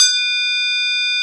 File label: Tubular Bell D4